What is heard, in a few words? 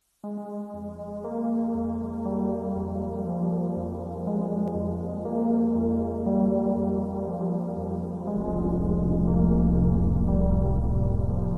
super slowed reverb; Dying Light